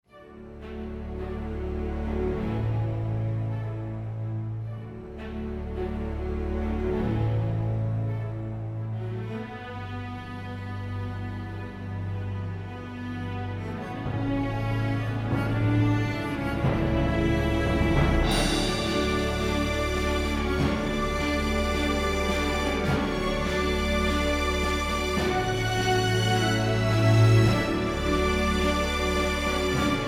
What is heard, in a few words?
orchestral emotional happy adventure warm sound; hopeful